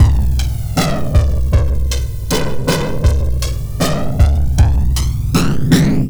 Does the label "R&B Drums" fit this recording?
Yes